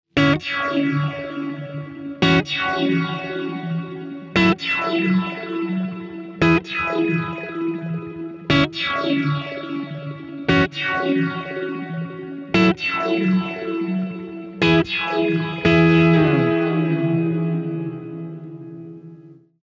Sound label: standard strong, handsonic; teraecho